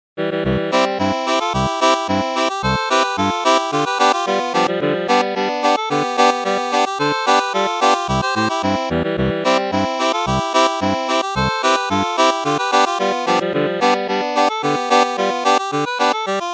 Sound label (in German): Boarischer 2 Harmonikas Stimme; Volksweise